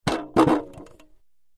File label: Wood Shield Falls Woo, shields